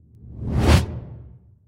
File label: whoosh transition